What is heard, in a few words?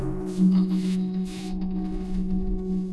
whatsapp tone; sound effects